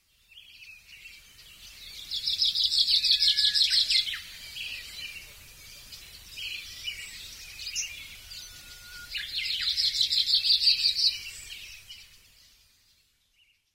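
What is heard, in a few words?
komushikui, Willow Warbler; koe; sakata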